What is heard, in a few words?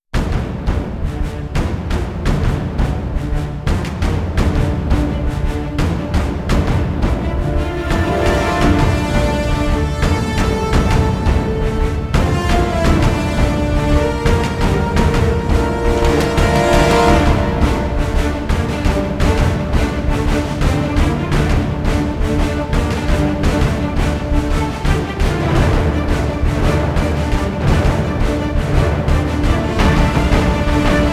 kronos non choir